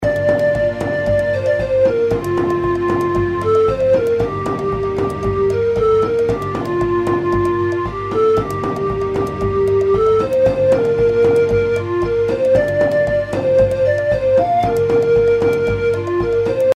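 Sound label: outro background music; Dreamcatcher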